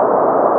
airdrag; linux-virtual-jay-peak; soulride

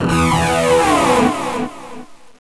level skip; solarwolf

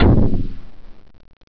die; pathological

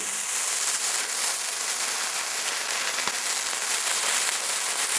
dynamite; wormux

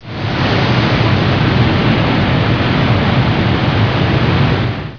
tidal, water; liquidwar

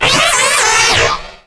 taunt; scourge; arachnatron

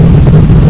flap move, aircrafts; flightgear

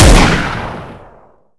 sniper; AssaultCube; weapon

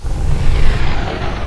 move ground mid

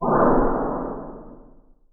blinded, magic; scourge

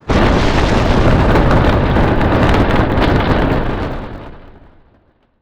incendiary rocket; weapons; ufoai

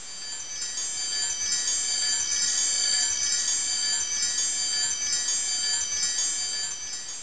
teleporter

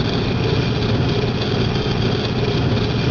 engine idle, Lockheed 1049; flightgear

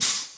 grenadier attack; boswars

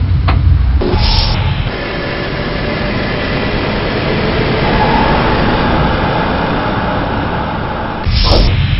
flaps, seahawk; flightgear

